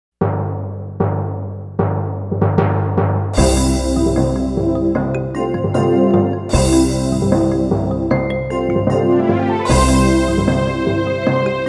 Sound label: Octave Warm Up, piano